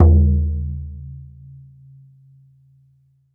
BODHRAN

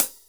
HH SR16; 90 s Sample CDs; Best Service Dance Mega Drums

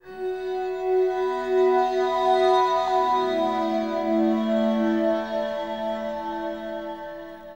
Scree Waiting, Acoustic Drone; Sonic Foundry (Sony Creative Software) - Friendly Fire; DroneLoop

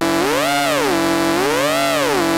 analog fx, synths; Rubber Chicken Software Sample Bank

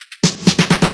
Fills 128BPM, Clubsounds

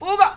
"UBAH", MALE; Spectrasonics; Heart of Africa 2; S.MALEAMB

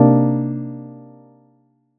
SY LUSHLY; ModelCycles

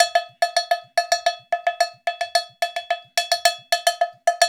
Island Bash; Spectrasonics - Supreme Beats - World Dance

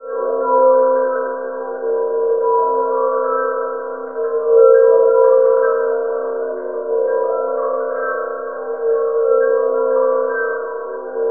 BELL ATMOS; Zero G; Malice In Wonderland

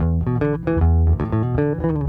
Cruisin' Disco Bass, 70s Analog Funk; Sonic Foundry (Sony Creative Software); What it Is!

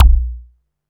BD SOFT, Techno 138 BPM

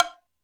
Hollow, Drum Machines Alesis D4

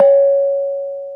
KENONG, Bronze Gamelan; E-MU Formula 4000 Series Vol. 4; Earth Tones

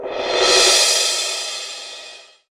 PROC CYMB

